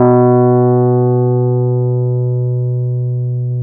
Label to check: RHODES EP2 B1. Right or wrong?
Right